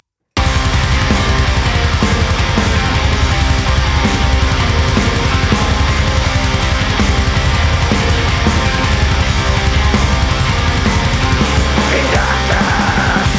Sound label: metal